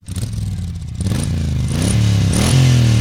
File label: Guzzi California